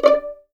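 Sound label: D5 pizzicato forte right, violins; East West; The Ultimate String Collection; VLNS PIZZ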